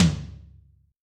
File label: Room P F, Tom, Yamaha DTXpress-II